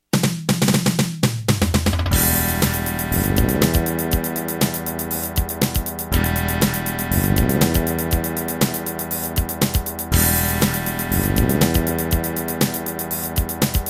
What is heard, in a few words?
Credits Terratec MiniWaveSystem SOWT-11; Dune II